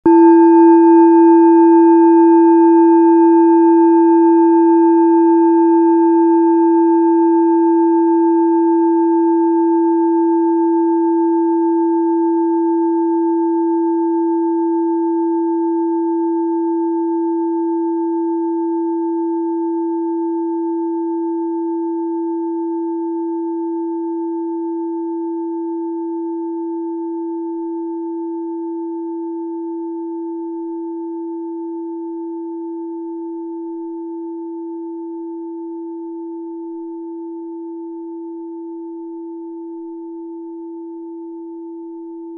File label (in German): klangschale bronze